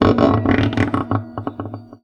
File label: Classical Guitar Extended Technique